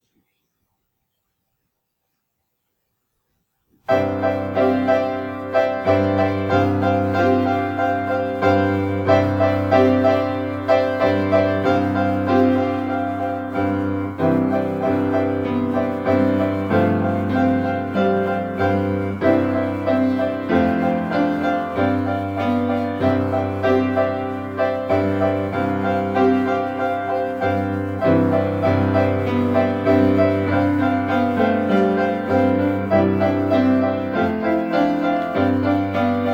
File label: Piano Duet